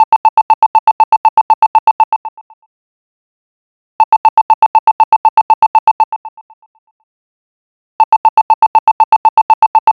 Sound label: cute little ro, cute